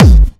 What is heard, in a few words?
Bassdrums Hard, Hard Kicks; Vengeance - Essential Clubsounds Vol.2